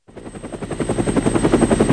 huey b; PC Gamer IT CD 32

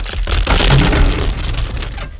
crush; duke nukem total meltdown

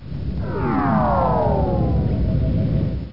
PWR DOWN; gemtrek

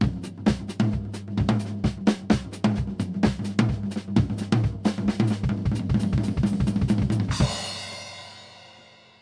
drums; Mäusejagd Interactive Demo; SOLOS

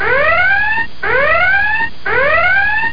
damage; alarmingclock

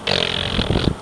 tongue; People; Sound Library 2000; Wayzata Technology

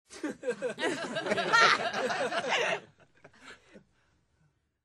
laugh; WMBonusXP

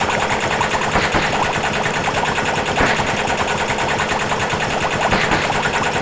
machine; Star Trek demo; Star Trek Audio

